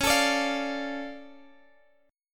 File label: D Minor Db, chord; Dm/Db strummed